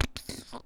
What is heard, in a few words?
fart